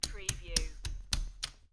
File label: Poker Chips Stack Chips On Top Of Each Other